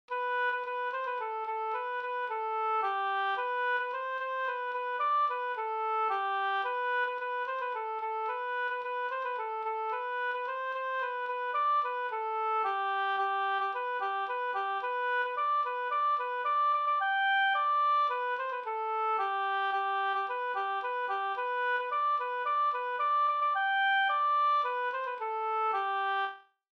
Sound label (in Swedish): slangpolska